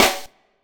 SD, dance drums hip

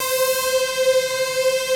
BIG FUZZER; Pro-Rec - Synthsonic

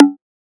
Bongo

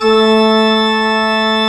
A3, CHURCH OR; Best Service; Advanced Media Trax Modern Composer

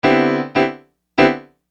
Acoustic Piano

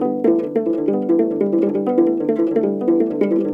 HRP AF HRP, Afro Harp Phr

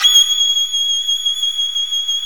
Stereo Solo Violin; E-MU Producer Series Vol. 2 – More Studio Essentials; Composer

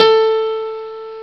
m1 piano 8' long, Amiga Soundtracker Sample Packs

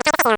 Akai MPC2500, Drum Machines

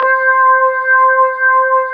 B3, Keyboards Old School; USB Soundscan vol.09; AKAI

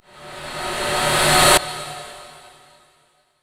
NOISE, Production Effects

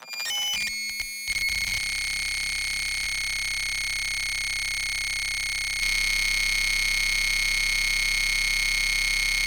high frequency fx

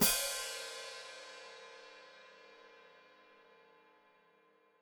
Hat Closed Open, Hi-Hat

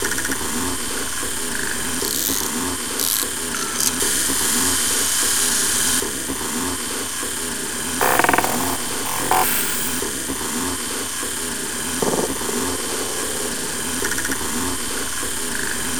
Difference Engine, Machinery; Sonic Foundry (Sony Creative Software); Myths of Technology

